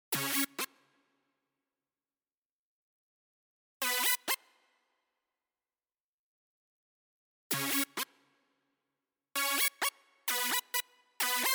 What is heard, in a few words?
Doom Mix Stem Layer; Asteroids